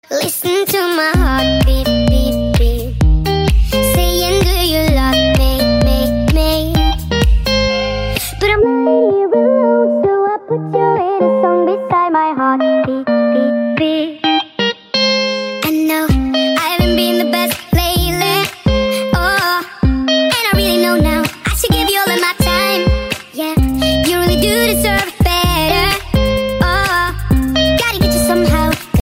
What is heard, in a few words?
Nightcore English Ringtone